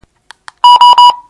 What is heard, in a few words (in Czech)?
pipani